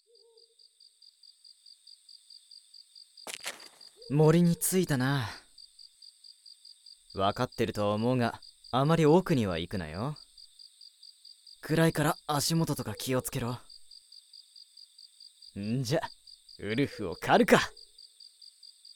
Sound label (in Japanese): 夜の森(コンデンサー)